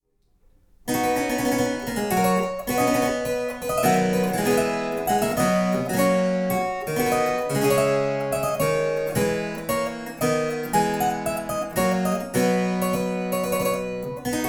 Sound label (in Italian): Var sarabanda Italiano, harpsichords